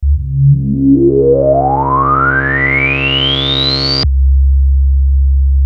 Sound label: ARP 2600 C2, synthesizer; Hollywood Edge - Giorgio Moroder Rare Synthesizer Collection